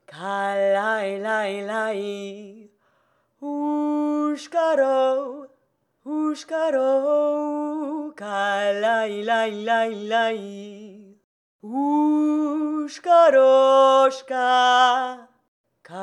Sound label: call; Shakra